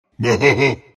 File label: alch laugh; Alchemist; Dota 2